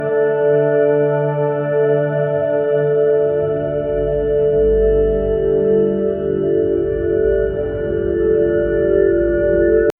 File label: calm ambient background l, gameplay